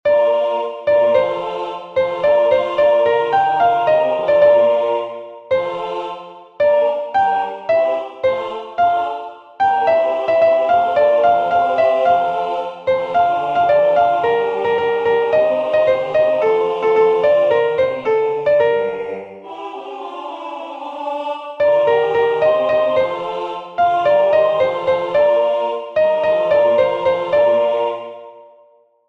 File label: Chorus Soprano; Brockes-Passion